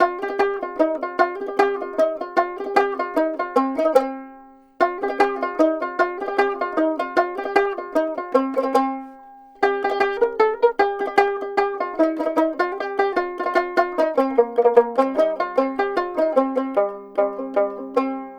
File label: Dotara Loop BPM 150 C; Bihu; waves of bihu vol 2; Gully Loops